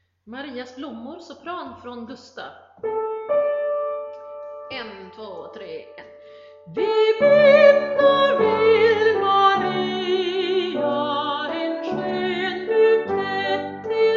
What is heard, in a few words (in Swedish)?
sopran; Marias blommor